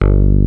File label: PPG BASS G1; E-MU Formula 4000 Series Vol. 3; Analog Odyssey